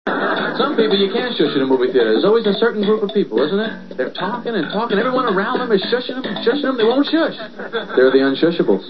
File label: shush